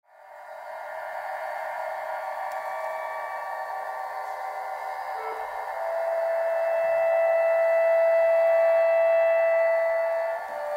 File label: Erosion Prelude